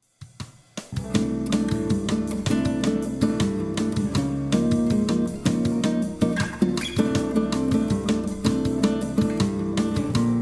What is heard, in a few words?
Samba chords